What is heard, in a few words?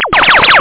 x Lase